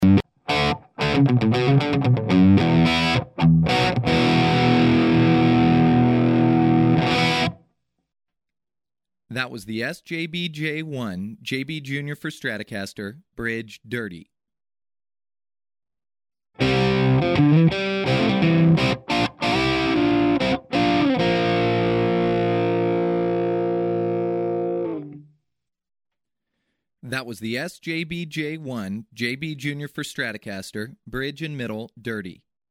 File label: dirty bridge